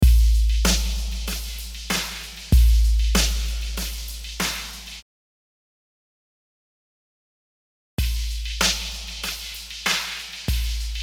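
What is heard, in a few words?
Tube Benders Dry Clean Distortion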